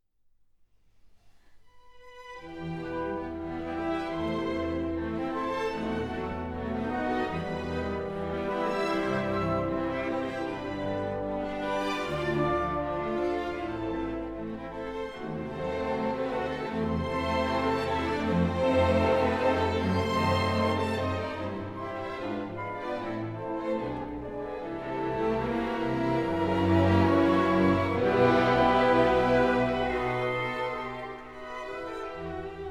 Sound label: Symphony 4 In E Minor; Brahms